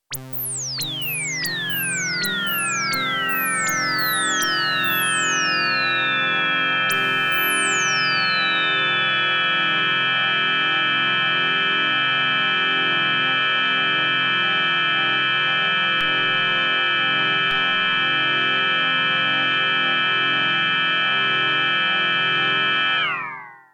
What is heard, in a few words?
REPRO-5 AUBERGINE Hi-Reso HQ